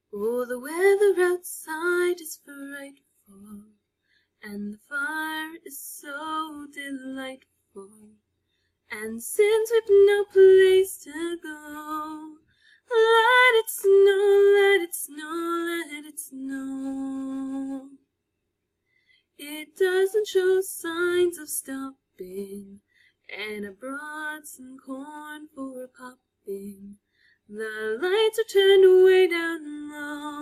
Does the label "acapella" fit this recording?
Yes